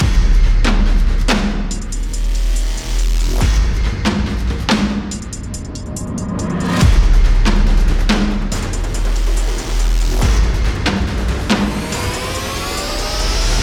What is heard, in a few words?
Battle Start Loop; Crossout